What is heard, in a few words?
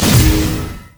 double attack; LoL; monkeyking